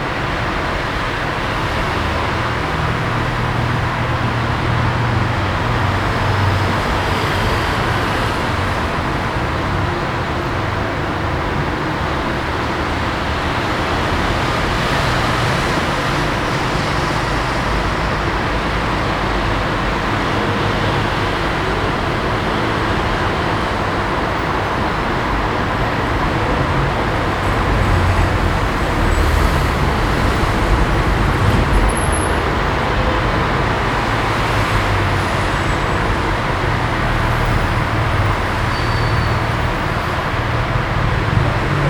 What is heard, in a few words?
traffic mush